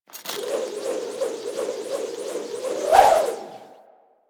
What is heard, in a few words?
Sword And Whip Idle Spin Whoosh; Warframe